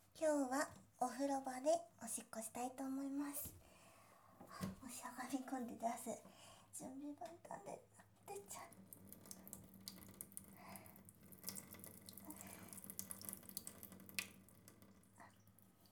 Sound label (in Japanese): お風呂の浴室で！オシッコですッ！(レコーダー)